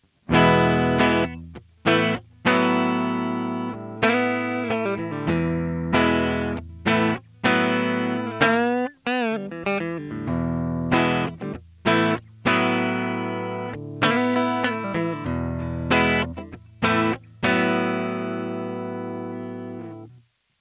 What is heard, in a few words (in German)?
Orange Gitarren Effektpedale Sustain Clean Referenz